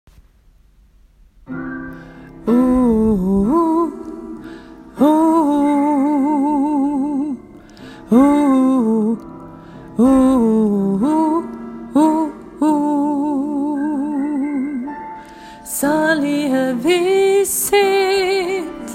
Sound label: salige visshet del 1 alt; åfjord